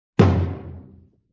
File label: Low Tom Hit